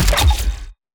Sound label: fire weapon short